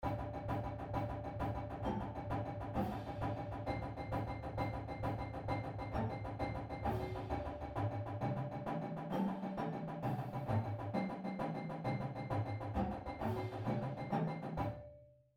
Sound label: Accents Flams, Drumline; pdlmusic; Drumline Ensemble Series